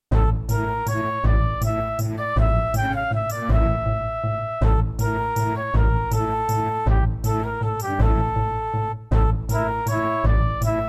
Roland XV-2020

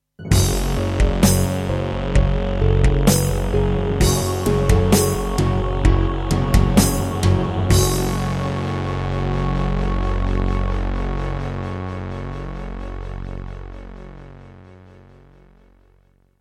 Yamaha MU2000EX GS MODE; Doom (Sigil); Introduction

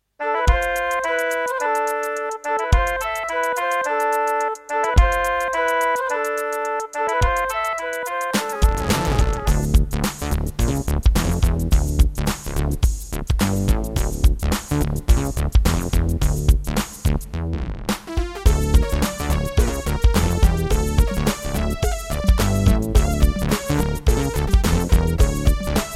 Egypt, Roland CM-300; Lost Vikings

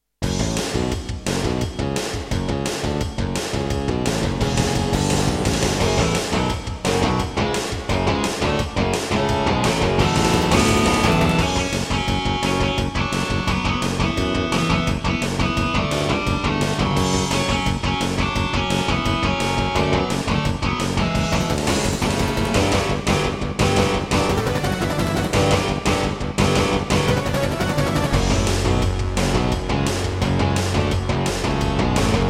Intro, Korg Audio Gallery AG-10; Duke Nukem 3D

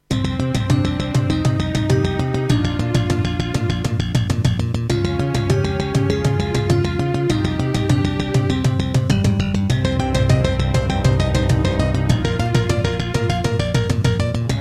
Roland SC-8820 88 MAP; Introduction; Hocus Pocus

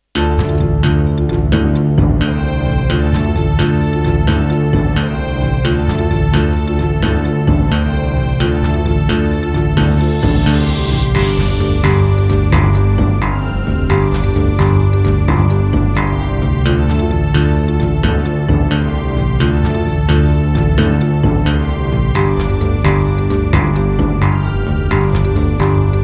Serdaco Dreamblaster S2; Heretic

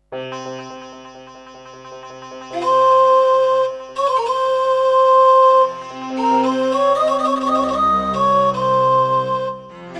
Roland SC-8820; Prince of Persia 2

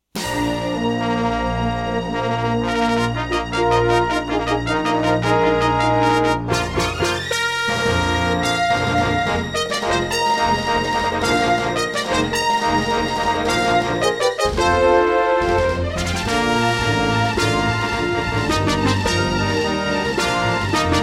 Intro, Serdaco Dreamblaster X2GS BURAN V1.1; Dark Forces

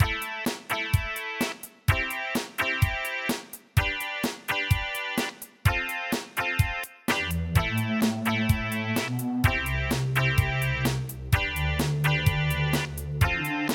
Casio CTK-2100; raptor call of the shadows

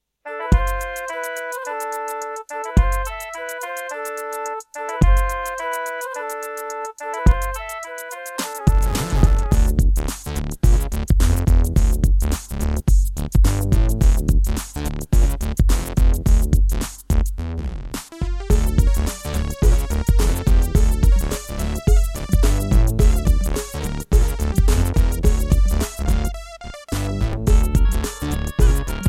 Egypt, Serdaco Dreamblaster X2GS NATIVE; Lost Vikings

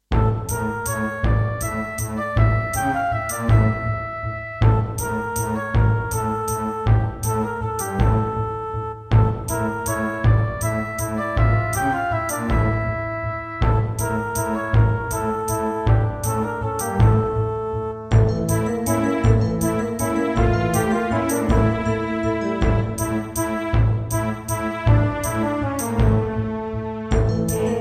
Roland SC-88 Pro

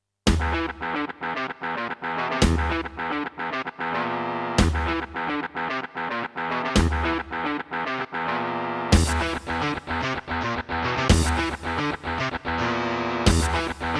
Roland SC-88 55MAP; Doom

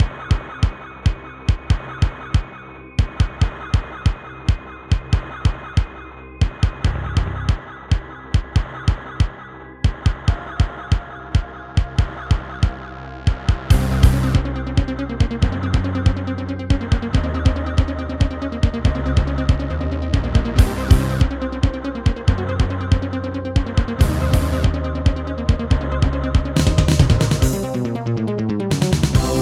Yamaha DD-55; sigil doom episode 5

